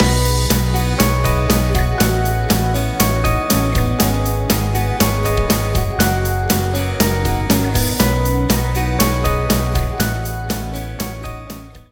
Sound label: drums in context, midi; some typically 'indie' instrumentation